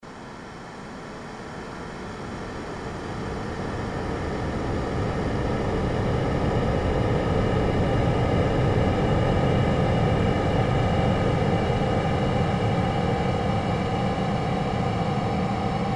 surge fade slow, Sound effects created by manipulating other sounds; Rise And Dive Sound Effects